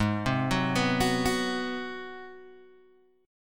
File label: G sharp 7th Sharp 9th, Guitar, Open G; 6,5,4,4,7,4; down; chord shape